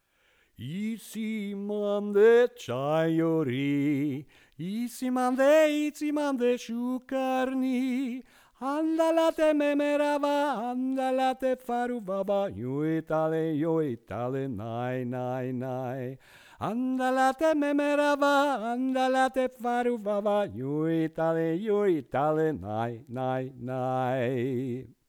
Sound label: second voice verse